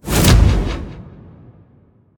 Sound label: intro whoosh; raijin; smite; poseidon